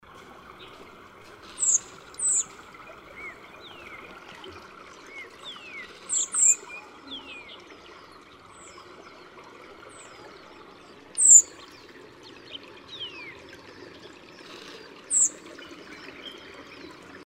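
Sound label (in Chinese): 赤腹鶇, Yushan National Park; 鶇科/冬候鳥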